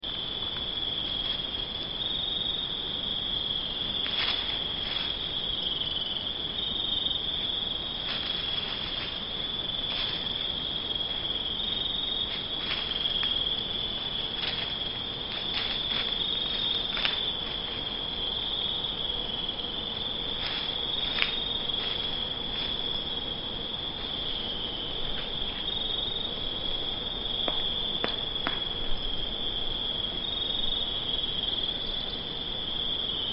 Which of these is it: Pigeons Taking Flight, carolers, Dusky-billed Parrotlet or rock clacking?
rock clacking